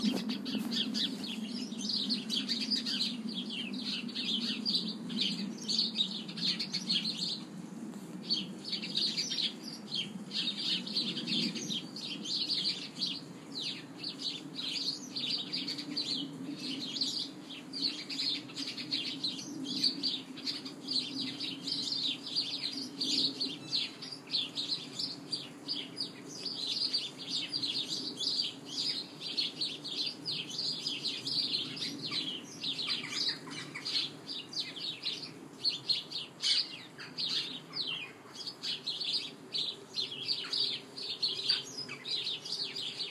front garden Pyracantha sparrows

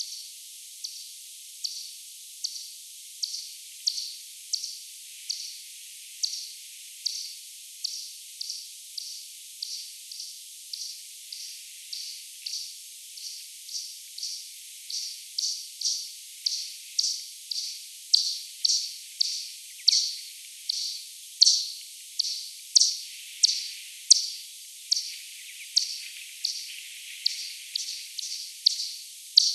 Daubenton, 10x slow, bat

